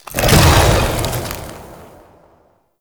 Destruct Release; Warframe; Broken Frame

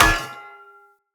Polearm Medium Impact Block Defender; Weapon Impacts; Combat

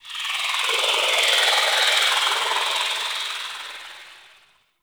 gas city distant textures; Warframe; Corpus Gas City; Ambience

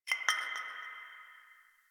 Interior Distant Marble Hit

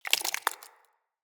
Sap Drip Fall; Ambience; Props; Neathuns Root Cave

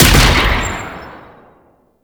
Arch Railgun Charged 2D Shot; Warframe; Archwing

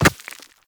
Walk Rock, Footsteps; Operator

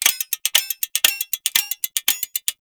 Ghoul Expired Grenade Warning; Warframe; Grineer Ghouls

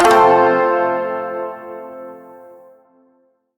Shawzin Maj Chord G; Warframe

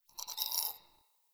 Tree Critters Angry; Duviri

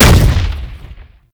Rupture Pillar Burst; Roathe; Warframe; Tau; Abilities

